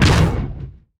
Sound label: heroes bonehart basic attack impact